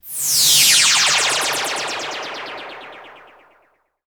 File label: EVAPORATE, LASERS STABS; ILIO; Trance Fusion